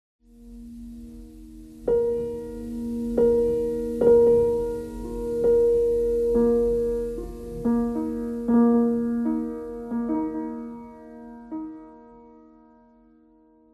piano noise

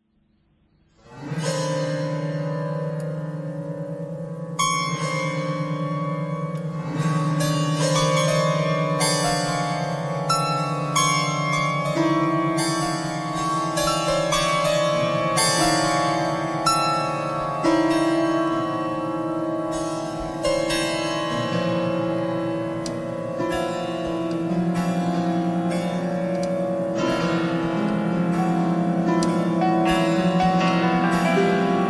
piano noise

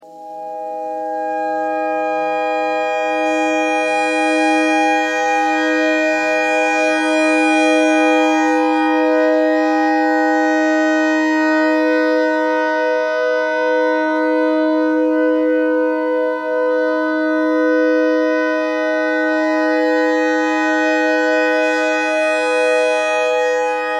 clarinet notes e

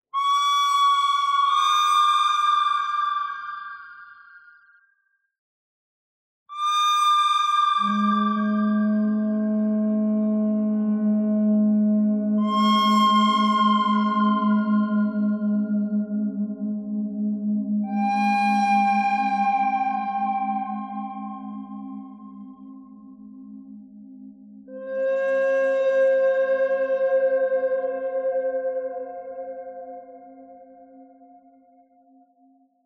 bird thread simple; noreverb

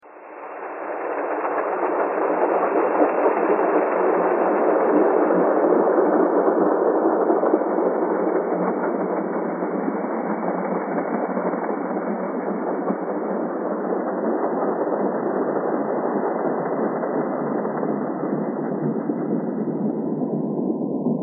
thread pentatonic

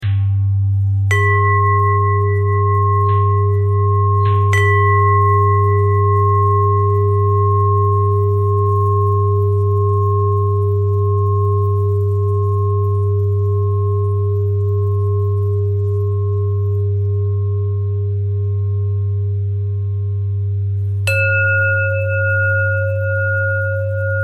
bell thread pentatonic

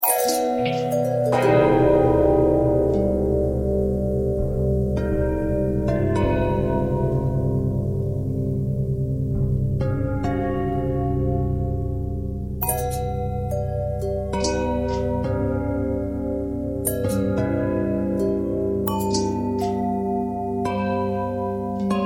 bell long bell harmonic twist

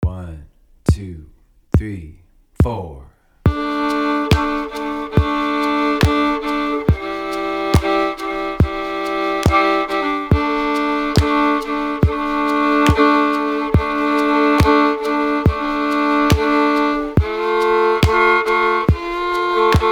Shady Grove Chord Mix

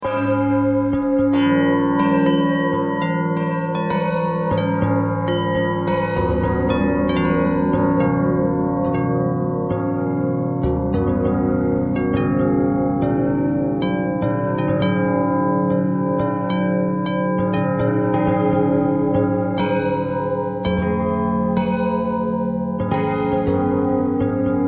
bell bell thread noise, bells

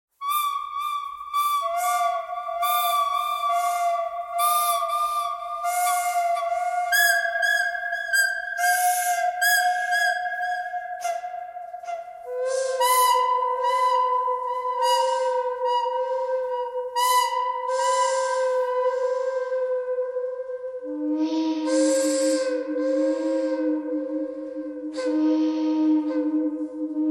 birdcanyon thread noise; birds

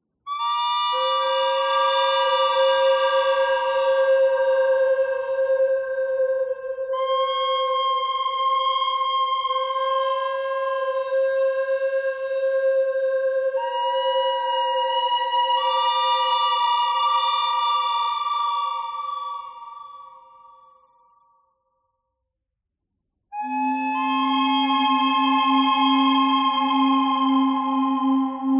bird thread buzz; noreverb

